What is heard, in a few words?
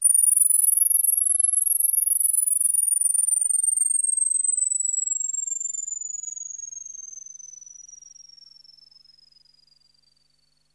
RADIO, ATMOSPHERICS; Music Store - Claudius Diemeter Schwerlos